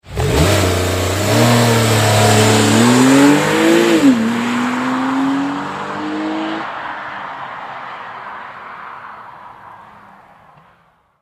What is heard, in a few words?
911 launch control